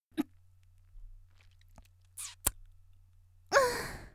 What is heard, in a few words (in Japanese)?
元気な少女, ゴックン後、口を離して「んっ」